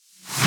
FX Rev; Multi Drum Loop 13

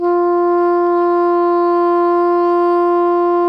SOPRANO F 3, Saxophones; Greytsounds; Philip Wolfe David Crozier's Saxophones

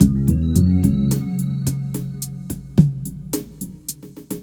BASS, Hip-Hop & R&B; USB Soundscan vol.47; AKAI

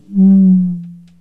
CONGA SET; OMI - Universe of Sounds

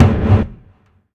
Tom, Drum Hits; Zero-G Trance Inducer; 90 s Sample CDs

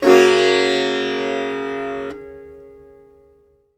SITAR CHORD; SITAR CHORDS

